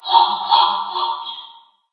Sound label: Gorilla Speaker call